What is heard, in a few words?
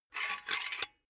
silicone gun empty pusher push fast; soundpool